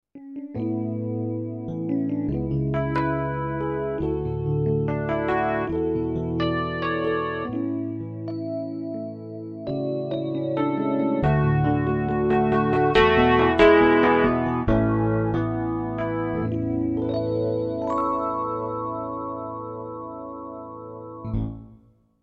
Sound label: Epiano